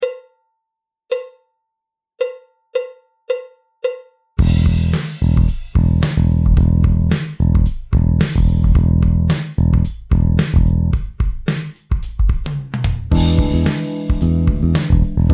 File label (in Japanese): ピックの脱力の音源カラオケ